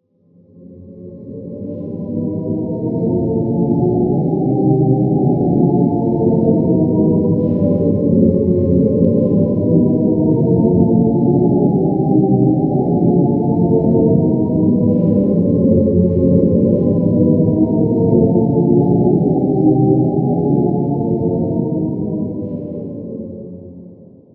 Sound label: Shepard Tone; DimDoors; Dimensional Doors